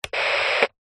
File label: technology radio small plastic turn on off short burst static